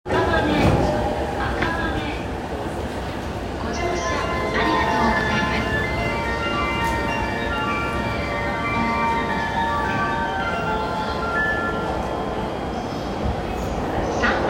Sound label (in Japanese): JR高崎線赤羽南, train sounds